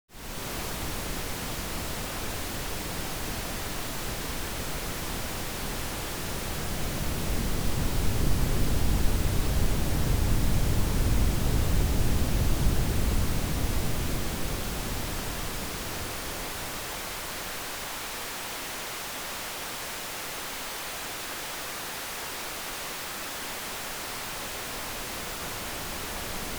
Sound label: Equalization Pink Noise; Equalization Bands (Equalized Pink Noise); Using an Equalizer